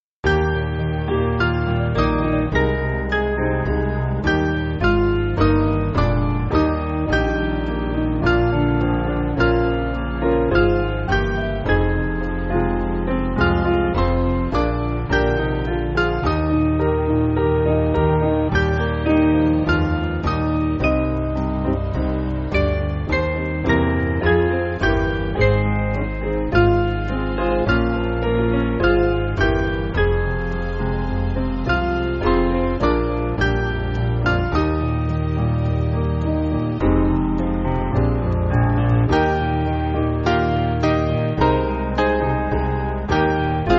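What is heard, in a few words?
Piano, Free Music Backing Files for Churches; Still Still With Thee; Consolation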